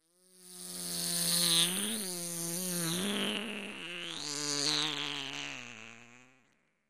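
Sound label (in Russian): человек изображает жужжание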